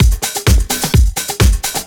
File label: Latin Loop 128 BPM